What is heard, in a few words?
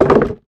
Rck Wood Hit